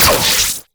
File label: orb bolt